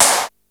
snare, Yamaha RY-8

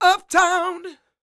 SING W, Vocal Planet Blues & Soul, BLUESY; ILIO

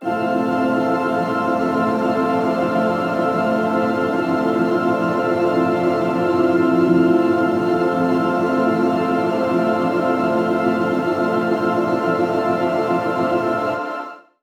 A MIN MID, pad

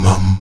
VOX MUM F#2, Afro Chants; Roland LCDP11 Africa VOL-1; Stacc Mums

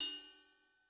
Cup Rock, Cymbal; Yamaha DTXpress-II

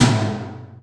WET TOM; E-MU Formula 4000 Series Vol. 3; Analog Odyssey; VK Instruments

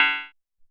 Sci Fi

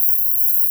KOR HP F4; Analogon

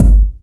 BD, Yamaha RY-10, Drums

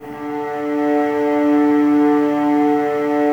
STR VAS D, Violas, No Vib; Miroslav Vitous

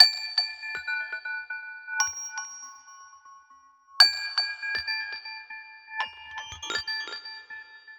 Silver Rod Piano Loop, Ethnic Instruments; Sonic Foundry (Sony Creative Software); The Electronic Music Manuscript Collection

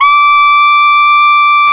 SAX TENOR

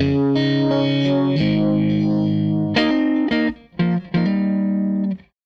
Tele Guitar Loop

